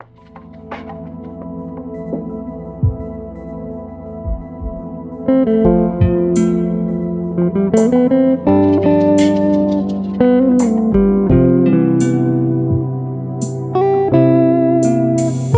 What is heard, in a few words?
Scales